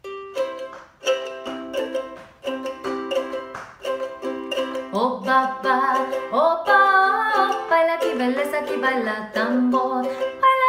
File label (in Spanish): tambores